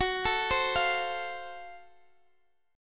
Gb Minor Major 7th Flat 5th Gb Guitar Open D; x,21,19,18,20,x; down; chord shape

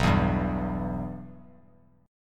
B Suspended 4th Sharp 5th, chord; Bsus4#5 strummed; JGuitar

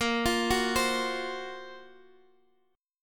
F sharp Major 7th Flat 5th A sharp

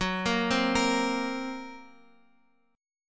Gb Major Flat 5th, Guitar Open F; arpeggiated; JGuitar

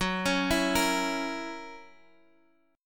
Gb 7th Gb down Guitar Open D

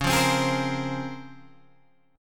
Db Major 13th, Guitar Open G

